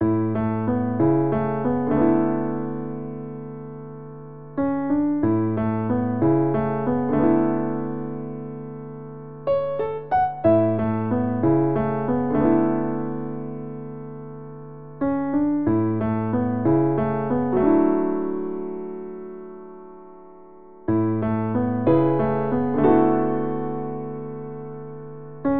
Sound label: Piano; Animal Crossing New Horizons